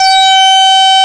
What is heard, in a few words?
SYNCHRO; East West - Global Sounds; THE CAT